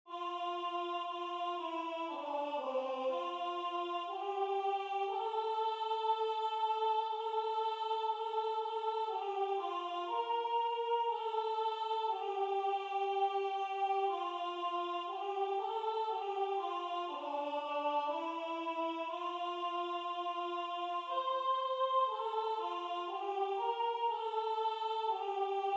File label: Soprano